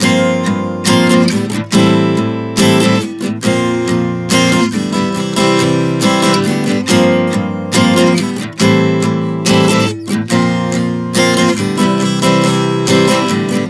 Progression 070 Gm11-Am-Bb-C, Acoustic Guitar, Chord Progressions 070 bpm; Sonic Foundry; Songwriter Acoustic Guitar Companion